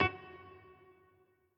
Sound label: piano riff intro